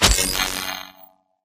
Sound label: Radar Jammer Jammed Start; Boost Card; Titanfall 2